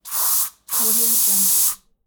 aerosol can spray; soundjay

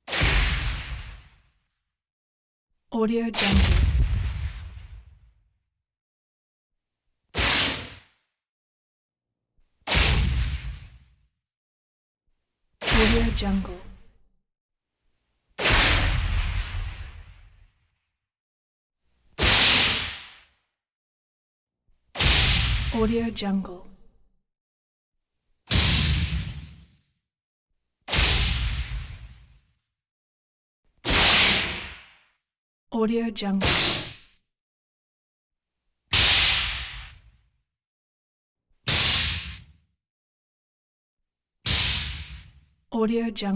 spaceship closing deep impact

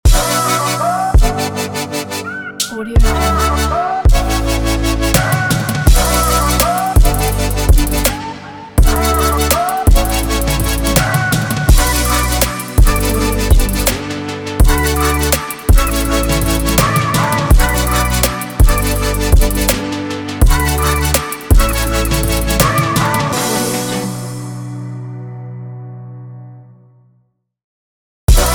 future bass logo

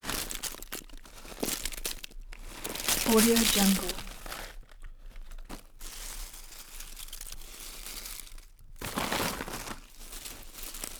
chips paper movement; prosoundfx

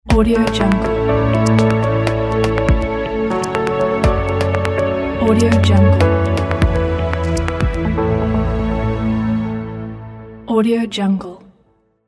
relaxing logo

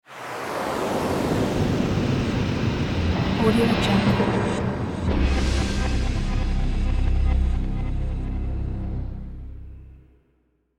dark logo; sound-ideas

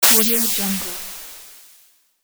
bright blast zap; sound ideas

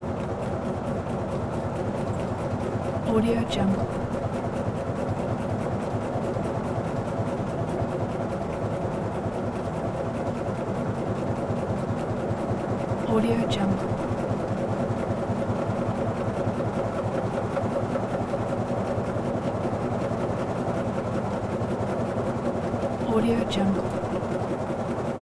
bus constant; prosoundfx

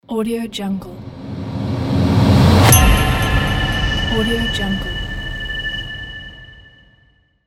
minimal logo

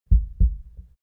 human body heart beat double; smartsoundfx

